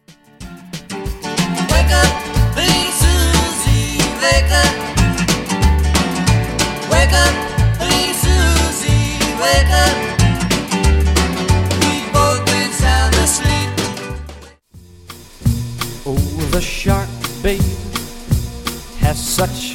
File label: 50s Redrum